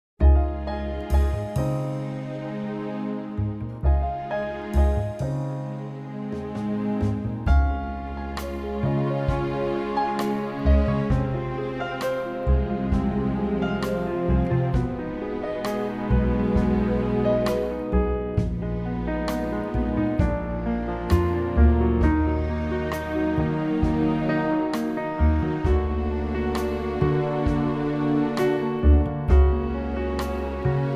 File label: truly orchestral Db